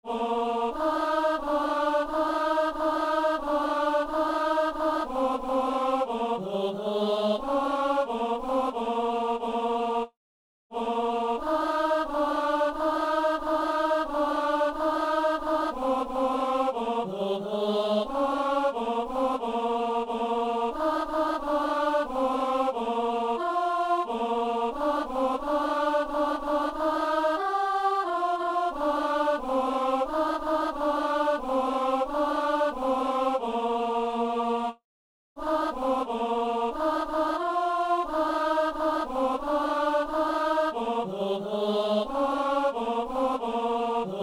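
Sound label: tenor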